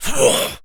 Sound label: Jump, Zombies; zps; Carrier